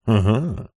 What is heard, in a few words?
human male laugh huh hmm